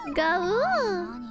gaouu

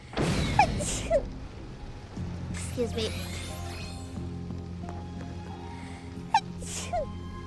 Sneeze

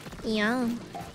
ya~

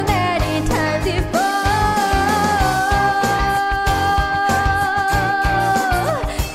karaoke

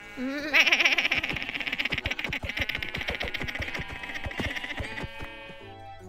sheep but evil

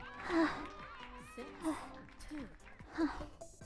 breath; IRyS

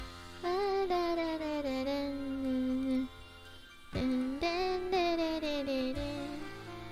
Singing; Sucker for Love BGM; IRySinging

